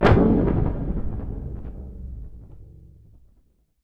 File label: medium cinematic boom